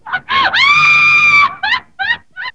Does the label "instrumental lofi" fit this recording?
No